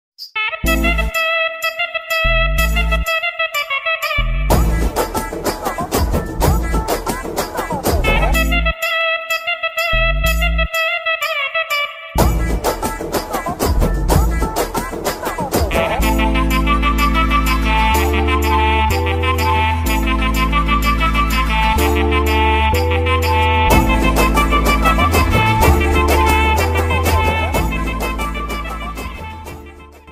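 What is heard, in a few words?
song background music; rashmika mandanna; varisu; varasudu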